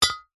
foley metal tubes small short fat clunk together single